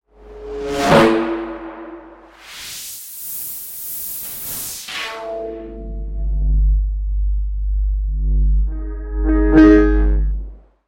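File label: sounds of endings outro, disappearance fading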